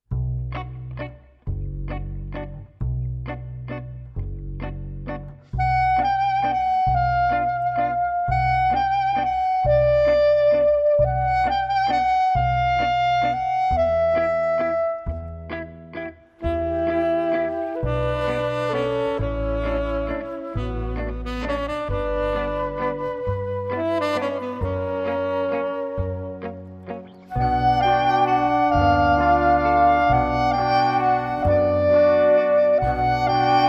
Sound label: Waltz